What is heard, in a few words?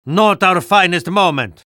spy jeers; vo